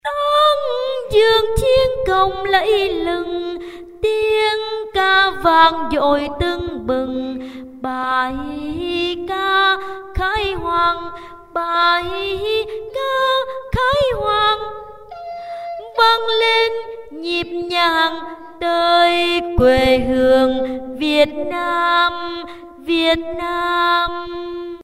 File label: alto